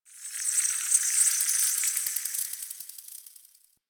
Insect Buzz; Warframe; Ambience; Eidolon Plains; One Offs

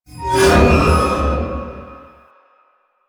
Weakpoint React Screech; Enemies; Lasrian Tank; Warframe

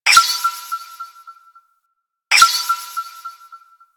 Tesla Coil Radar Ping Loop; Ambience; Warframe; Corpus Gas City; Gameplay Remaster

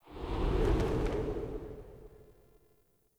Well Jump Whoosh; Level Events; Midrath

